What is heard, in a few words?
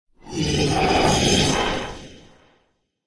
Voidwalker Void Wraith Death